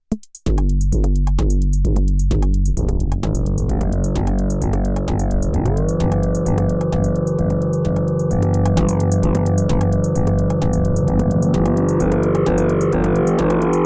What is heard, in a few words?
Erica Synths Black Hole DSP2 Drone Bank